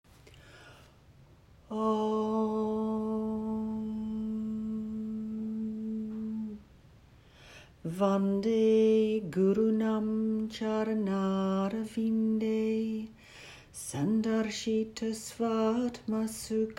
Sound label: Opening Prayer, yoga; Practice Tools